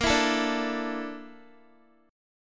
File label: A sharp Major 7th Flat 5th, down, Guitar; strummed